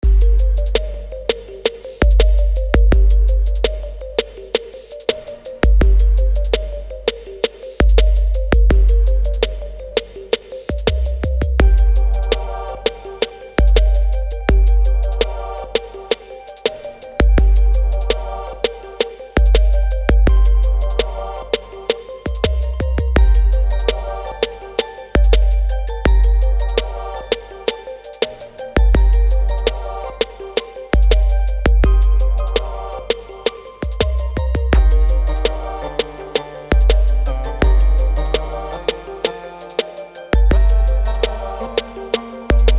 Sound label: Gang beat